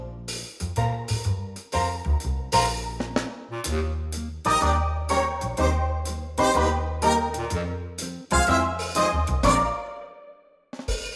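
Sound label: Bb Eb, backing track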